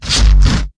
huge damage; Weird and Interesting Hits; single hits

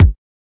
kik

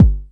909 Kick; DRUM MACHINES; 909 Extended

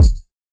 floppy bounce kick; Kicks; single hits

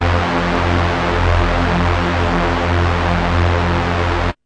bass F1; multisampled; must destroy you bass 1

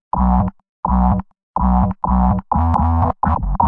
126 clipped filterbass

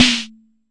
Jomox MBrane, drum machines; Jomox MBrane 11

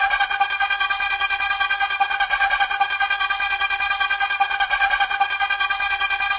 150 scratching synth percussion

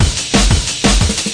break, DnB Breaks 179 bpm; 141 to 185 bpm; Ethex

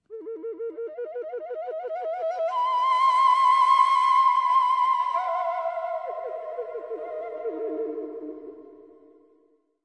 native flute tremelo; Flutes

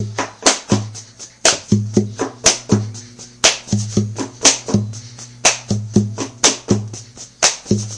believe im right percussion, Folksy

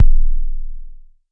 Jomox MBase, drum machine; Jomox MBase 01

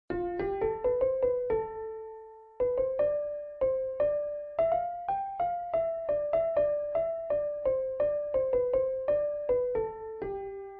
toy piano farting around; KEYS ORGAN PIANO

